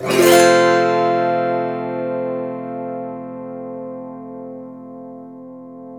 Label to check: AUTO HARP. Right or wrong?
Right